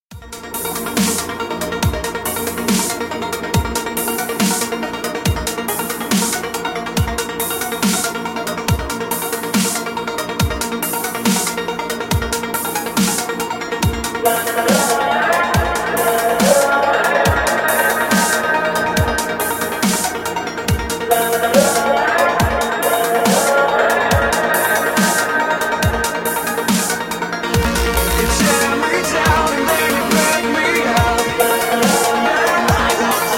guillotine remix

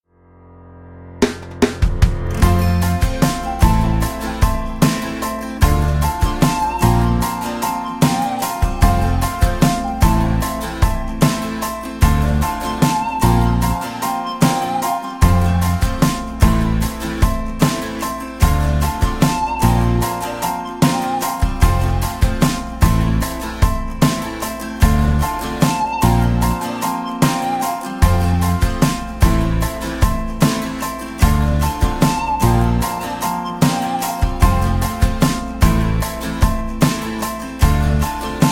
Rap Playback

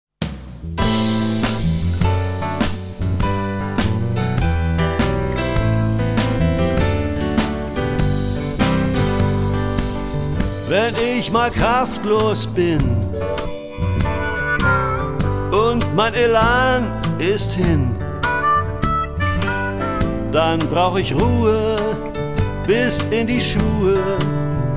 Blues

